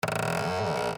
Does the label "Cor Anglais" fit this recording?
No